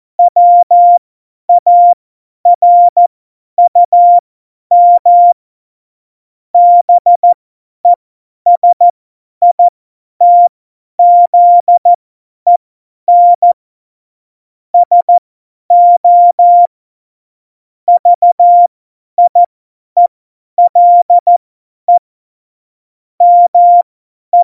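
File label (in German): Witz, cw morsen; Morsekurs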